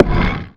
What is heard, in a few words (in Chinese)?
嘎枝之心击打声